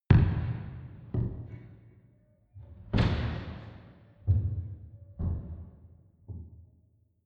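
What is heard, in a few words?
step church movement, footsteps; menschen; schritte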